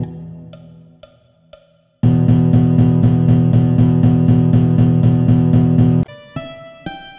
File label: Rhythm example, blues